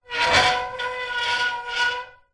industrial ladder metal slide movement screech metal